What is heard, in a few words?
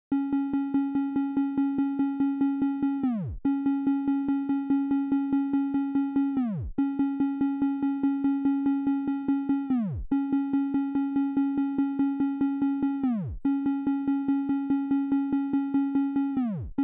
144 BPM C# Min Triangle, loop stems; Melody; Cymatics; 2022 Melody Collection - Wavy; Playboy